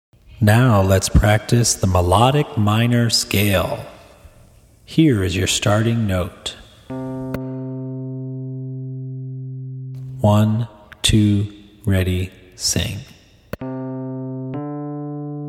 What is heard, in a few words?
melodic minor scale